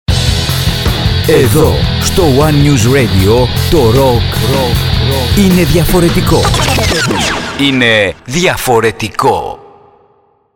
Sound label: spot rock